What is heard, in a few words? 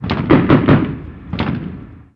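gun us assault medium; ModernWarfare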